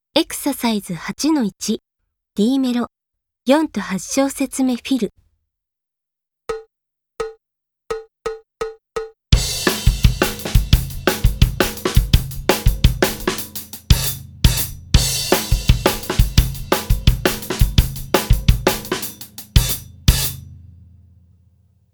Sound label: Mellow 4, 8 Fill; D Mellow 4, 8 Shousetsume Fill; K-ON